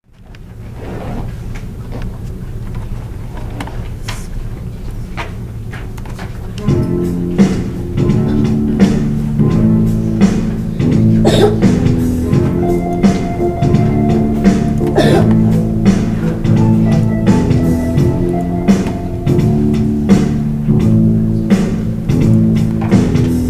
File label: chorus version